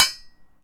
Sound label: Hit Golfball Metal; SFX Kit; Misc Sports